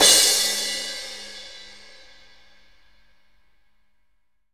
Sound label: Room Crash, Room Drums; Akai MPC500